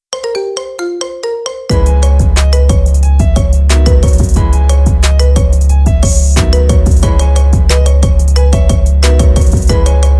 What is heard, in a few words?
marimba remix